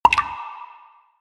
voda odinochnii zvonkii blizkii

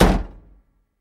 zahlopnuvshayasya dver starogo avtomobilya

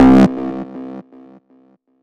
ejsid haus odinochnij v prostranstve

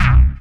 knopka zheskaya korotkii zvonkii blizkii

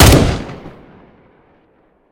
dolgoe eho posle vistrela

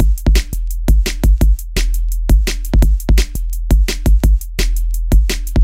solo na barabanah

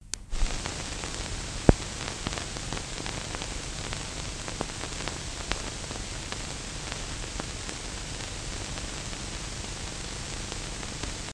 shum zapisi tresk pomehi shipenie

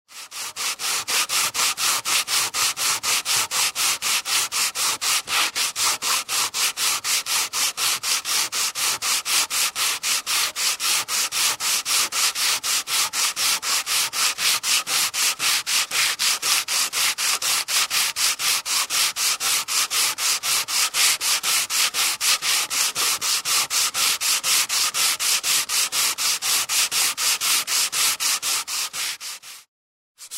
nazhdachnaya bumaga grubij nazhdak

